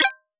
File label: Camera Click; android-6.0.0 r1